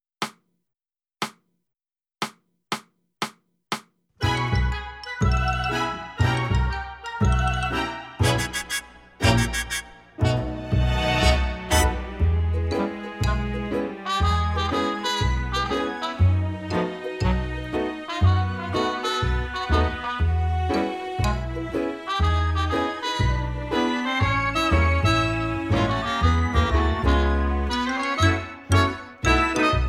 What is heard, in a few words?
Backing